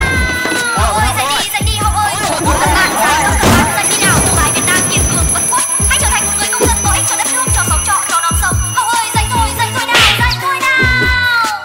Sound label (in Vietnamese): Nhạc Báo Thức